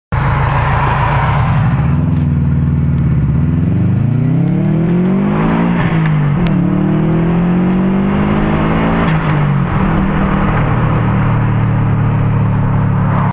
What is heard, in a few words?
Respons fully recirculated